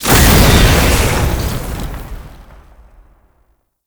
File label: Tank Spray Stop; Enemies; Weapons; Effervon; Warframe; Lasrian; LasrianTank